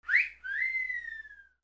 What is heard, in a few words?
WHISTLES